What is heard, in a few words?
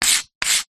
office chalk stick thick draw line street pavement